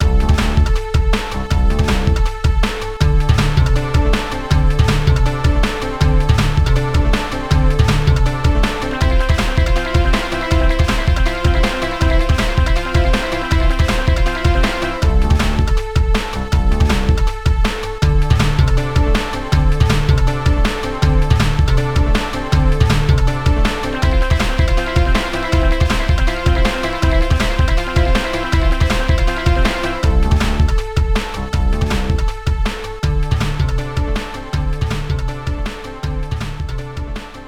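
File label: background music; My Frogger Toy Trials; Nintendo DS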